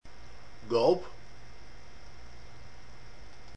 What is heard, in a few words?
gulp